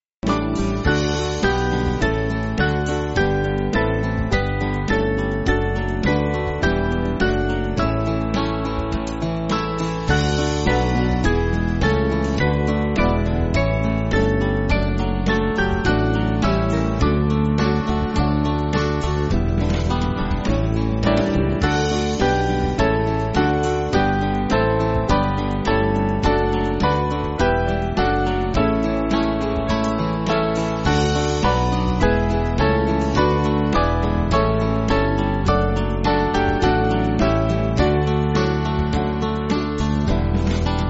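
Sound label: Band, Backing Files for Churches; We Praise You Lord; Crucis Victoria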